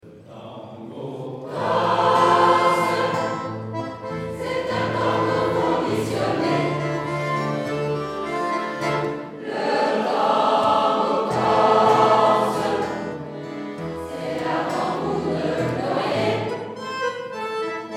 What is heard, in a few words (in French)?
tango corse